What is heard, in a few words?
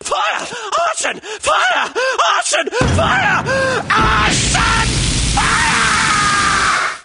fire; arson